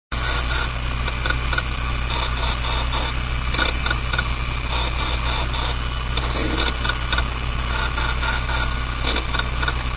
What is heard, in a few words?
hdd crash